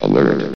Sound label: Alert; Half-Life